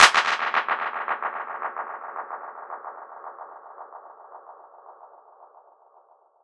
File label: Clap; BlackEarth